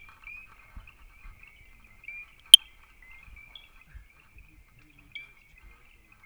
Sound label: Pristimantis mazar